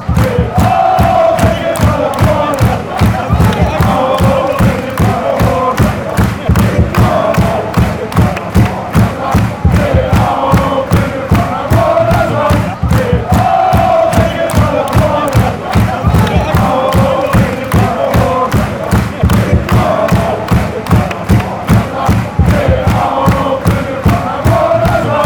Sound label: chants; stand up